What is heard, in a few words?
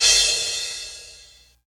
crash sound sample f key, Royality free crash cymbal sample tuned to the F note